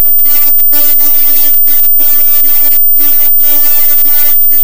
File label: fox